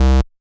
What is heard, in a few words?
bass